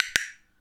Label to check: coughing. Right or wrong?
Wrong